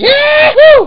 YAHOO

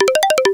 DRAW; Dominion

